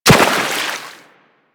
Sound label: Run Water, Footsteps; Warframe; Operator